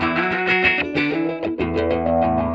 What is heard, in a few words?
Pulse Delay Guitar; Guitars